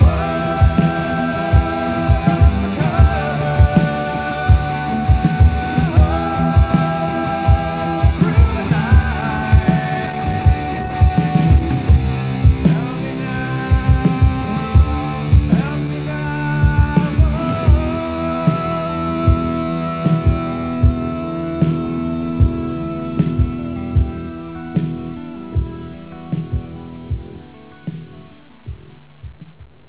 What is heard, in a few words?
chorus